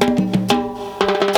LATIN FILL, DRUM FILL; Crypton - DrumBase5000

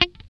GUI F4, E-Gui Muted; Sound & Vision; Gigapack I CD 2

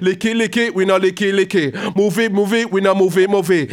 G-PHRASE, RAGGA IN G

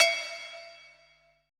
cowbell E; BPC PERC

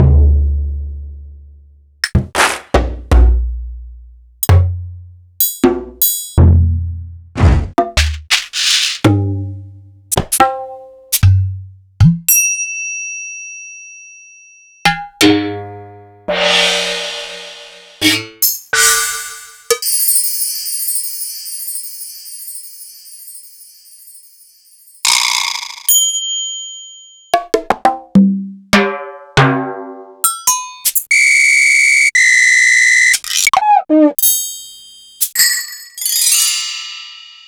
Yamaha RS7000 Perc Kit

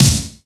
SD, Yamaha RY-10, Drums

